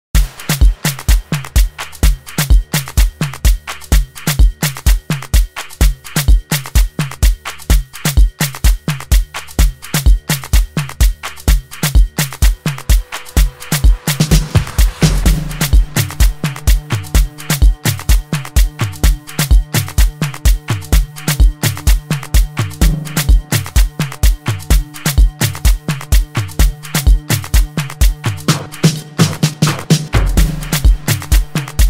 deep afro house

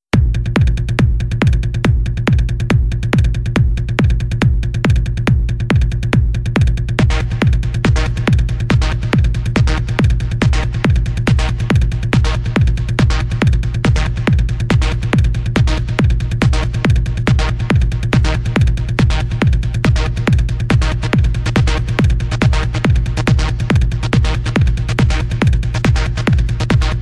adagio for strings

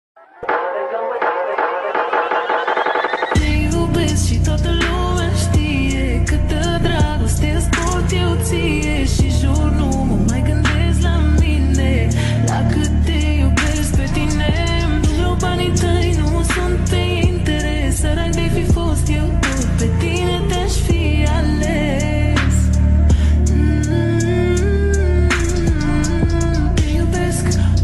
manele slowed reverb

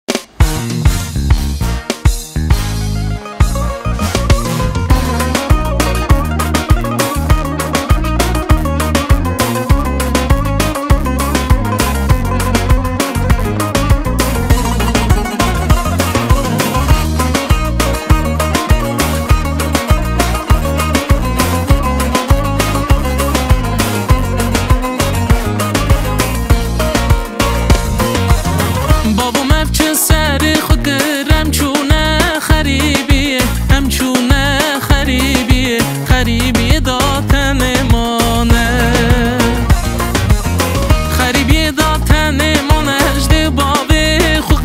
acoustic version

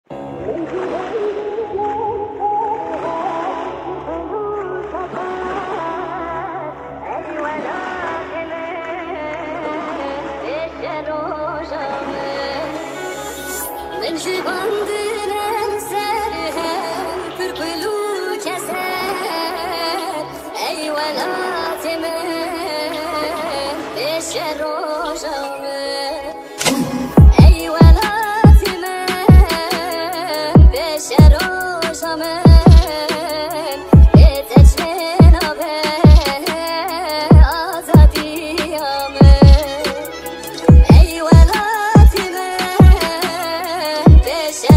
kurdish trap

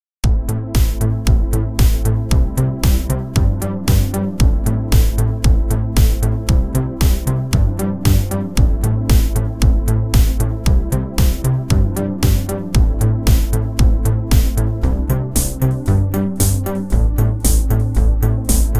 korg pa 900 eurodance

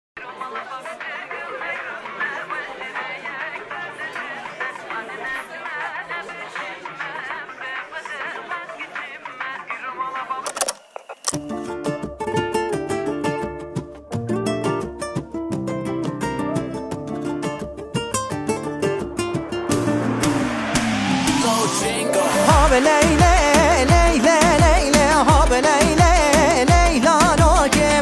kurdish mashup

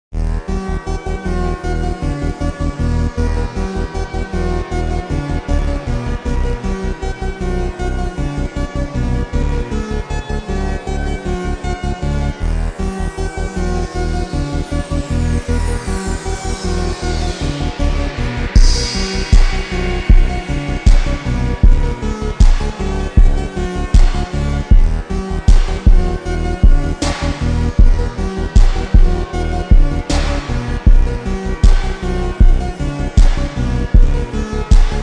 super slowed reverb

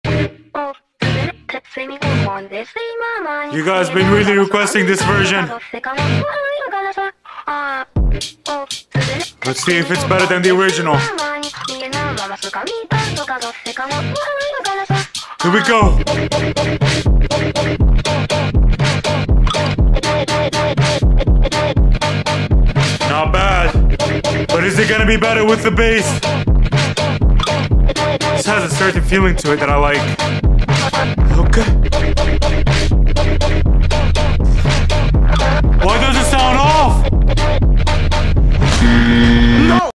cute depressed slowed phonk

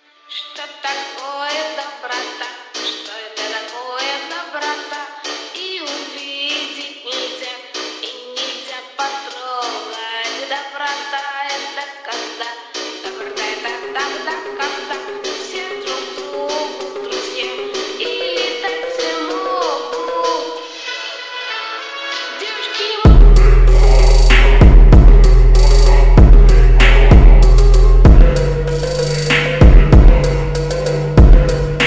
phonk remix slowed reverb

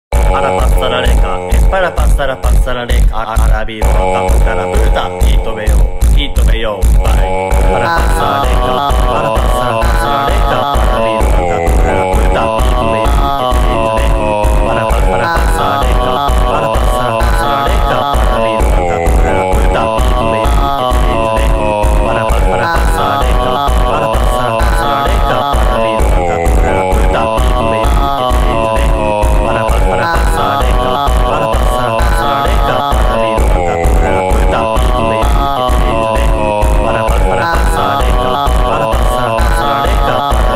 phonk japones

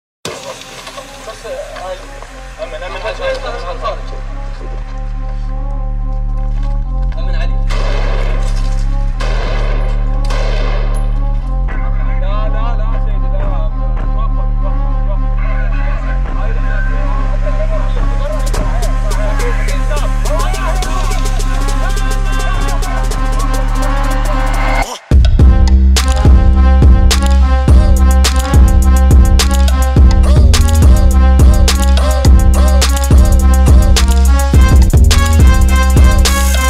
arabic trap music

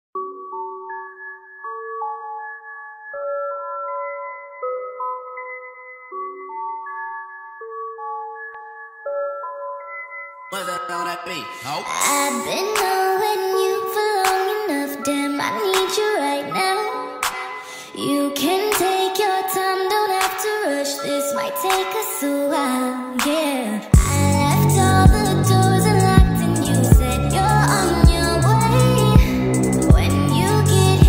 sped up reverb

